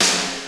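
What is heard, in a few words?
snare, funk kits; Crypton - DrumBase5000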